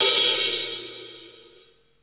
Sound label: HH, Drum Dry; East Collexion; Drum 1 Dry